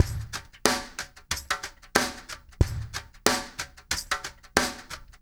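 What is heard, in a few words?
lofi drum right; Spectrasonics; Liquid Grooves 1 & 2; CAVERN SW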